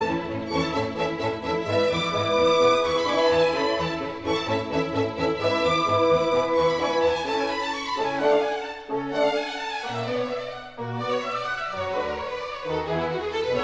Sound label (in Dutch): Allegro klarinet en orkest A major; Mozart; K 622